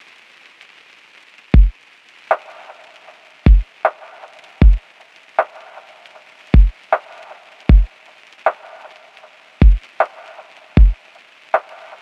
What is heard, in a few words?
music; processing-java-primer